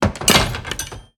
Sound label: Axe Underlay Death